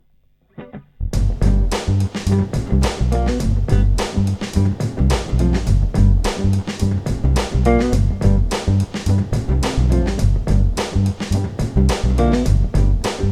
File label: Funky Happy Hip Hop Beat